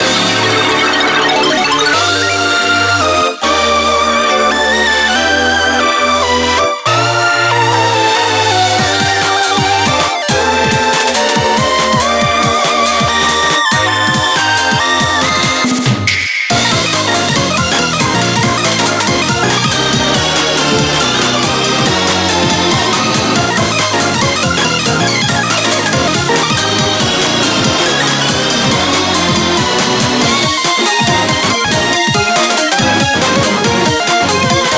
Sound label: Bgm Reach; Mahjong Fight Girl (Arcade) (gamerip) (2023)